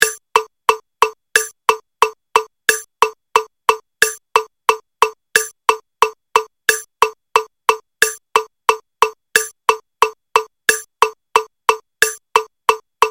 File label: met 180